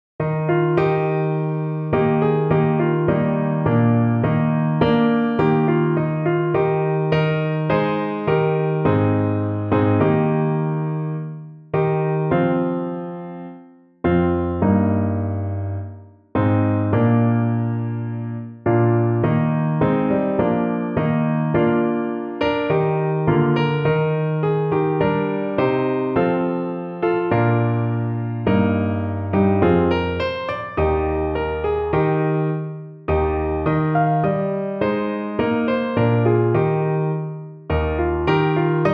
piano

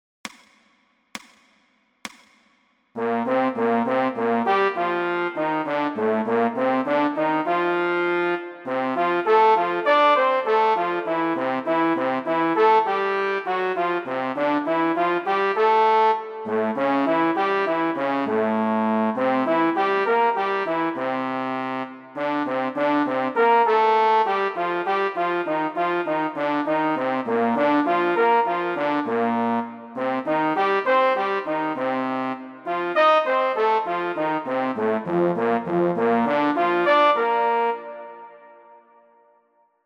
Study fast, trombone; Blazhevich

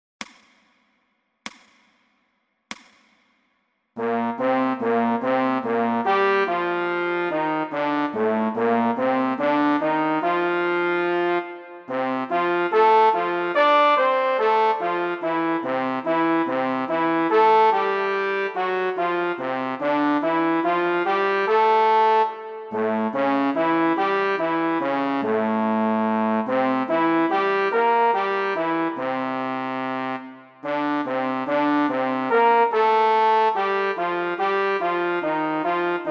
Study slow, trombone; Blazhevich

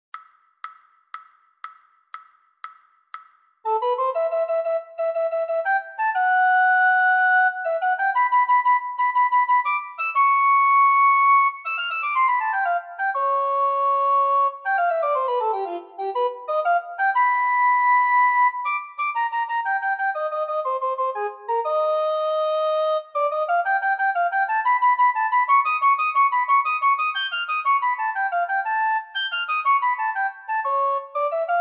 recorder, 120

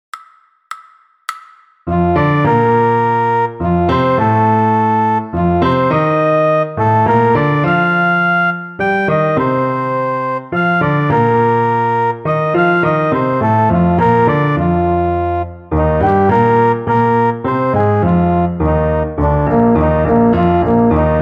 medium, trombone

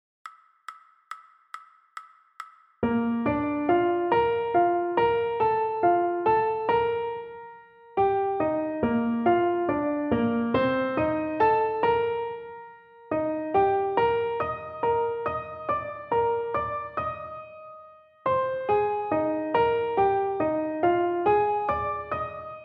trumpet

